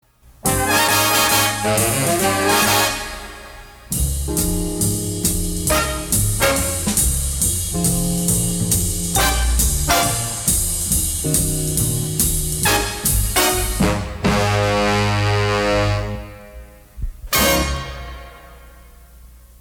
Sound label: Inst; Swingin' With Radio 1130; Without vocals; CKWX; 1961; Anstey Collection; Jingles